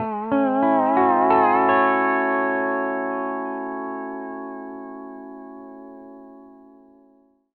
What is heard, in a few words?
LOOPS 140, Country